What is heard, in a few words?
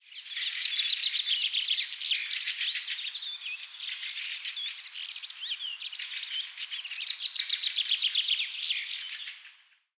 Birds Chirping